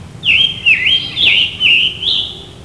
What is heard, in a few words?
scarlet tanager; natureNavigator